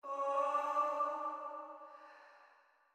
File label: Chime; chime sharp